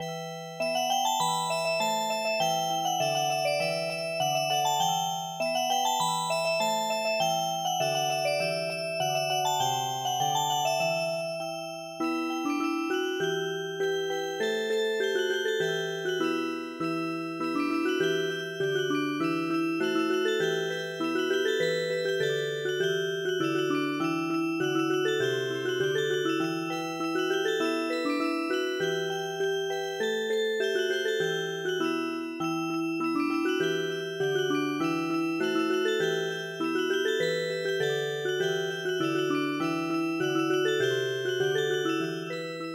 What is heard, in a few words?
Music Box; Rusi Kosi